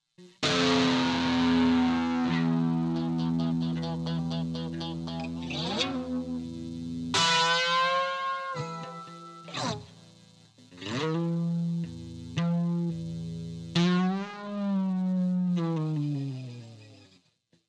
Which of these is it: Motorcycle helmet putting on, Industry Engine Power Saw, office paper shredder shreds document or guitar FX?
guitar FX